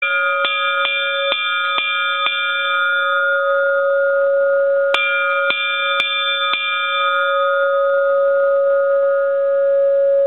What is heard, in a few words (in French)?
clochette